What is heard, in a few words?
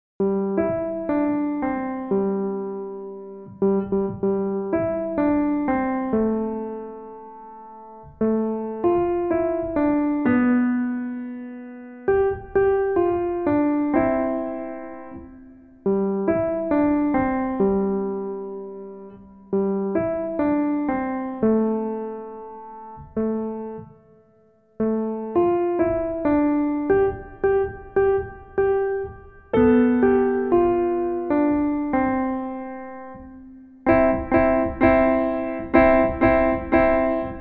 Jingle Bells